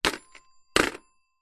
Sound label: household telephone vintage rotary style hang up receiver; zapsplat